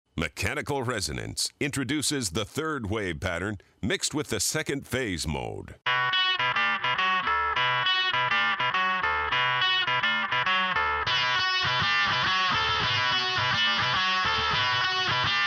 Mechanical Resonance

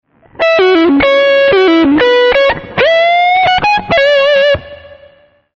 blues solo

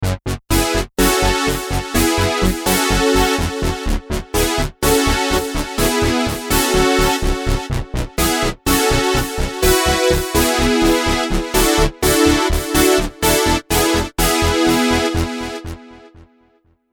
power brass

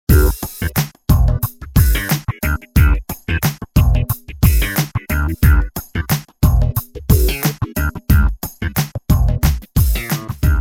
funk

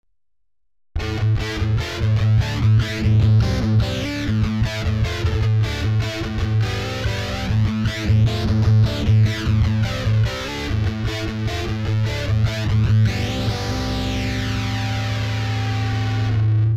heavy flange

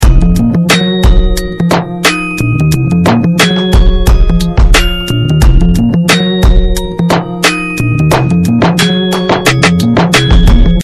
Recall 89bpm

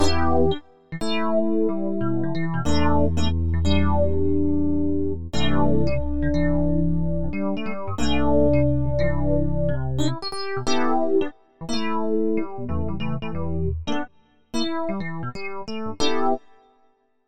Like an Old DX7

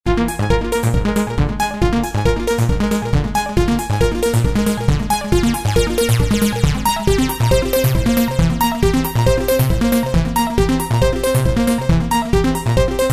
Odd Even Harmonic Distortion

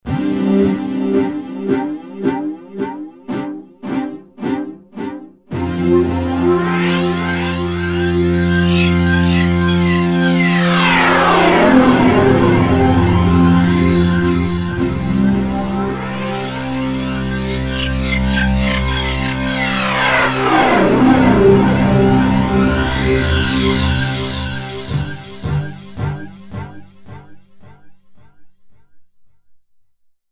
sweep

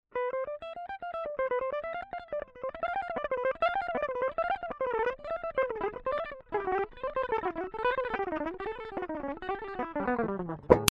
Huge gain

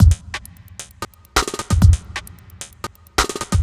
drums strange

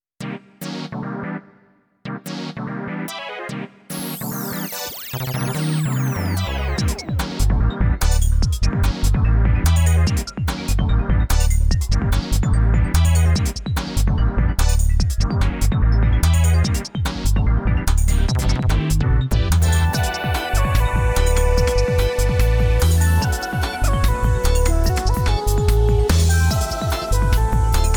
Dub n Bass

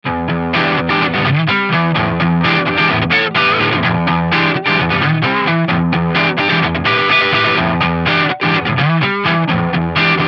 Blues Rhythm Fuzz

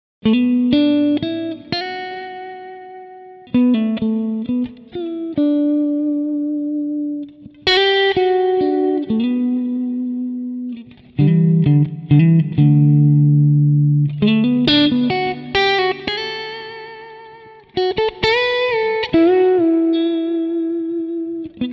FAT crunch REV

